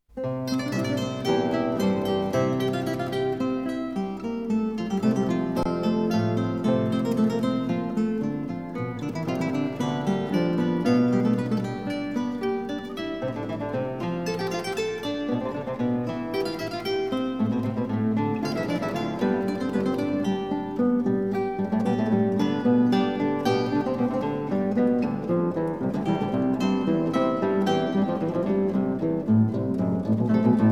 Two-Part Invention in B flat major, Guitar Quartet; for keyboard No． 14; BWV 785